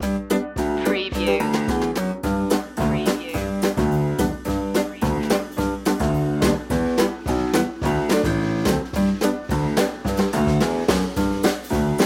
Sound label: shimmy